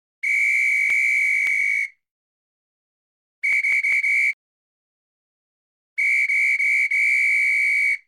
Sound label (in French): Sifflet